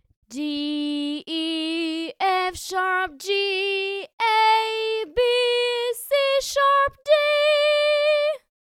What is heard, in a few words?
sung scale